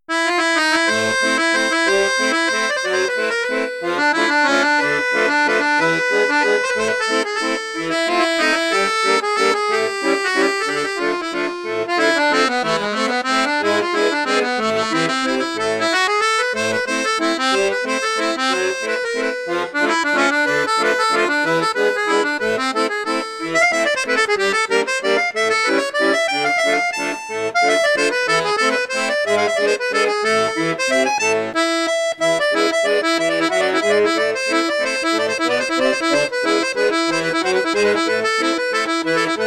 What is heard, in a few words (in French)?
registre flute juste, accordeon; paolo soprani